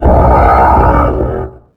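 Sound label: commando voice die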